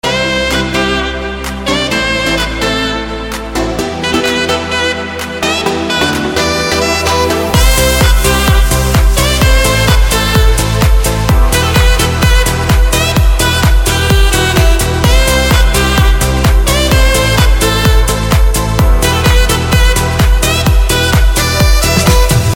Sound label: play my drum